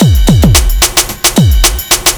block rock kit

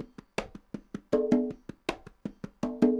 cong bongs 80, latin, 80bpm

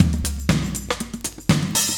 120bpm loops; Sound Effect Library

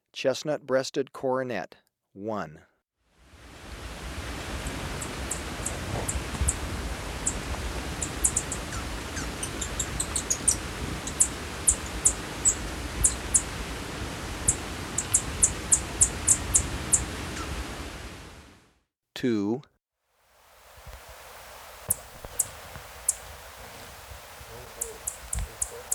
Chestnut-Breasted Coronet; S. America

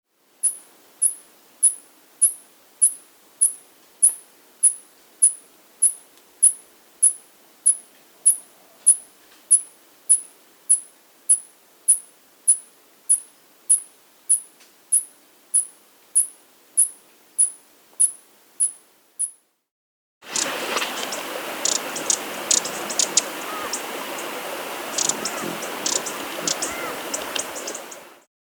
Speckled Hummingbird (Adelomyia Melanogenys); S. America; Andean Birds; Natures Songs

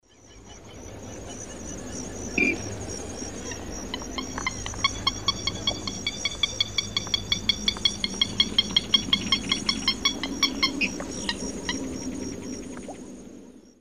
Porphyrula martinica, alarm calls; S. America; Eastern Lowlands

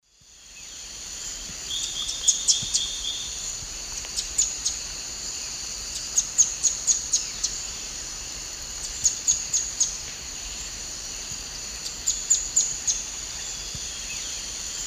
Xenops tenuirostris, song; S. America; Eastern Lowlands

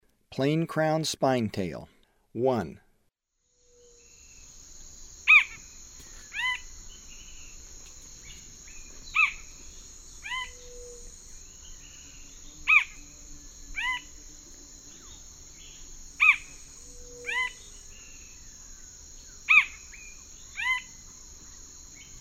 Plain-crowned Spinetail; S. America; Eastern Lowlands

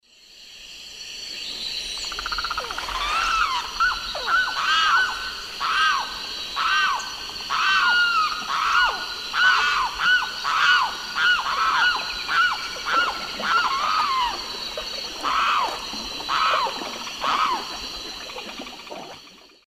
Aramus guarauna, song and calls of group; S. America; Eastern Lowlands

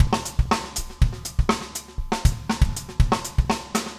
funk, 120bpm, loops

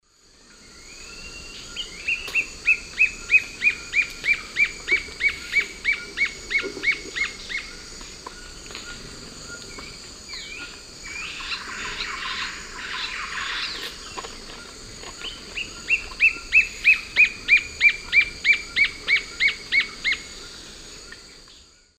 Myrmotherula axillaris, song; S. America; Eastern Lowlands

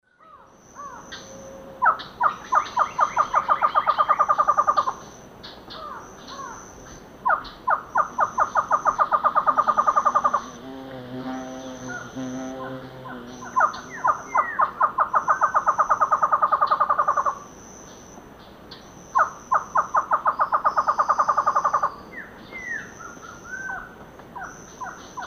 Thamnophilus cryptoleucus, calls and duet; S. America; Eastern Lowlands

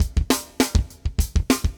brute, metal

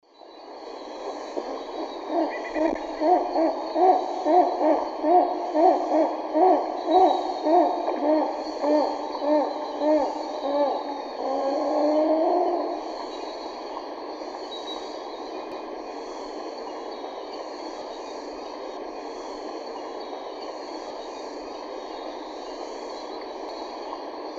Tigrisoma lineatum, song; S. America; Eastern Lowlands

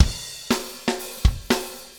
Funk Rock Beat, Drums

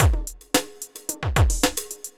evil beats 110

